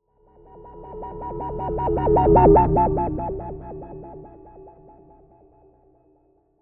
Hover Robot Pass By, Scifi; Robots; BlastWave

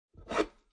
Foley, Aerosol Can Pick Up And Drag From Table

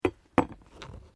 KNIFE KITCHEN PUT DOWN; Various knives; Kitchen (Sound Effects:Household)

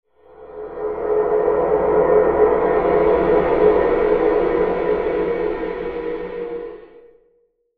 Horror Spell Air Swell Mysterious; Scary Soundscapes; Blastwave

